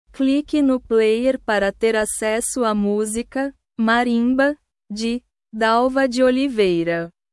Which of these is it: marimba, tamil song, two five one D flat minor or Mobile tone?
marimba